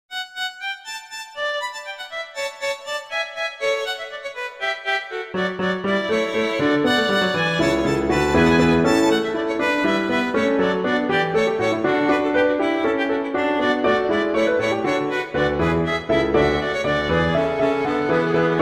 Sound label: Allegro; Corelli